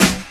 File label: 00s focused hip hop acoustic snare f sharp key